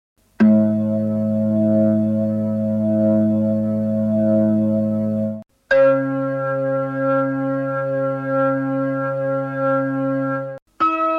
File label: hammond